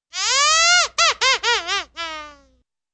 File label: laughing; fly